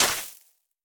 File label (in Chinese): 可疑的沙子踩踏声